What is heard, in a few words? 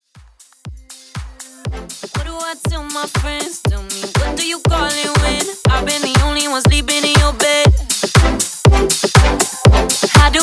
Dance Uptempo 120 BPM; ReDrum